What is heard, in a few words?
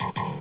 Game close; GameRanger Beta